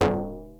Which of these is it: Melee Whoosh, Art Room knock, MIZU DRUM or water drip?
MIZU DRUM